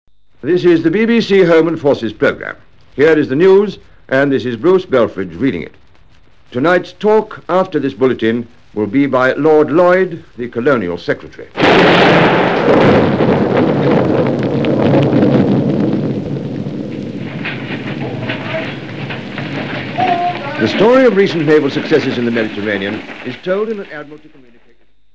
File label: bomb; 1940 October 15; A bomb scores a direct hit on Broadcasting House, London during the BBC's 9pm news.